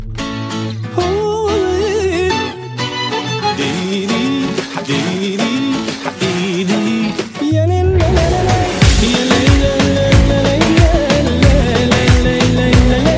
turkish music; ringtones

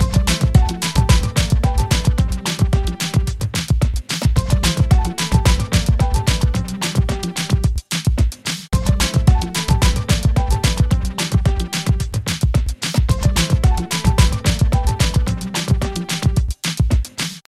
Piano

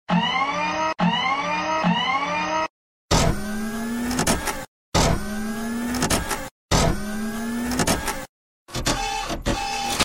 Robot sound

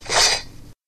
Snikt; Wolverine

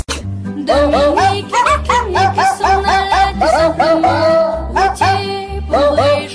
Dog sings

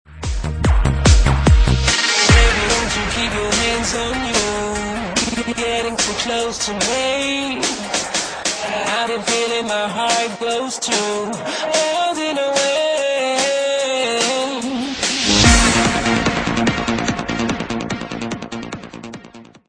techno; techno-park

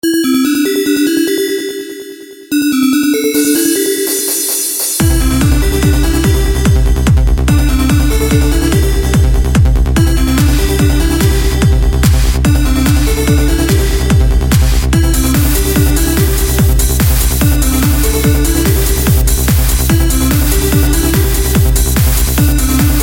Sony ericsson remix

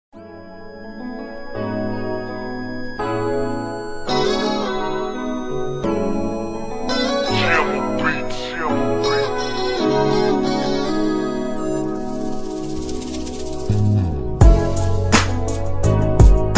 g-funk

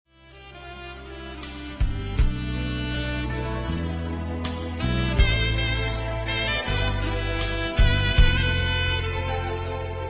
sax solo

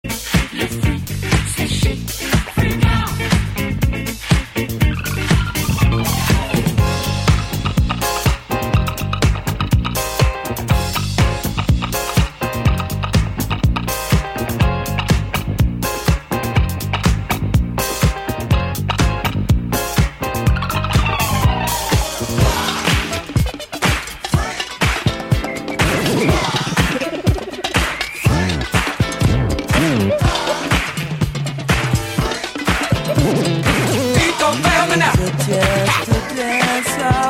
club mix old scoo

